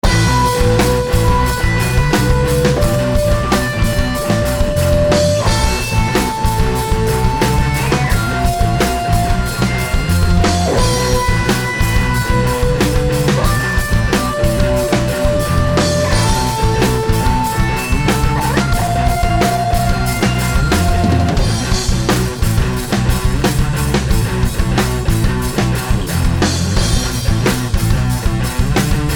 BEAT FULL BAND, Beat Loop Full Band Hard Rock Groove